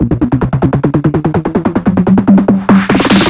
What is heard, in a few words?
145 BPM drum fill; Singomakers; Infected Psytrance